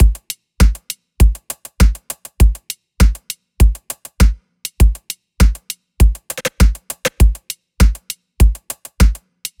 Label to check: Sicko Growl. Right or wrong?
Wrong